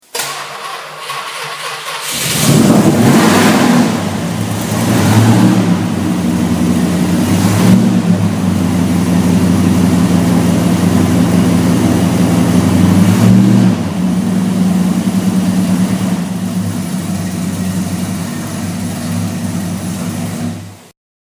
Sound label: DODGE RT